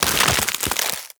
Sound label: Ice Wave Spawn B; Warframe; Corpus; Arachnoid Camper Terra; Abilities